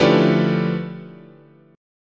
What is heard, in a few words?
D Minor Major 13th, guitar chord